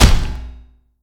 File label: meat hit; Lucius; SFX effect meat hit